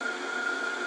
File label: fast transport belt; factorio; Factorio 0.14.21